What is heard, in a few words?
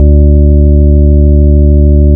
ORGIE BASS, Bass Analog & Synth; USB Soundscan vol.12; AKAI